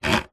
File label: studio controller rotary knob turn